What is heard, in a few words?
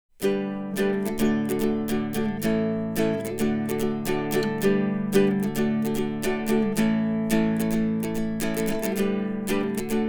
Guitalele s Happy Place